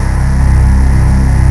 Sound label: Knockout Reese